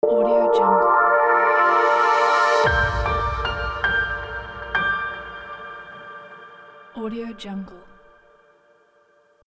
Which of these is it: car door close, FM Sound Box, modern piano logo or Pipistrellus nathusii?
modern piano logo